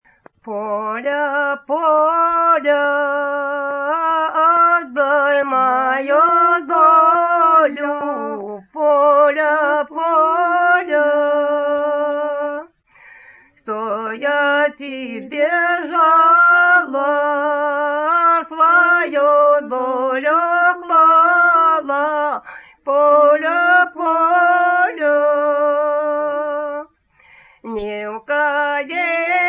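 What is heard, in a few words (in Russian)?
Жнивные песни Себежского района; д. Веремеево; "Поля, поля! Атдай маю долю!"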